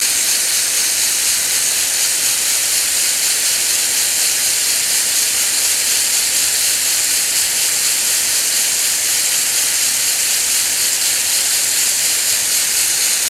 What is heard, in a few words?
Rainbird Spewing Out Water, No Clicking, Loop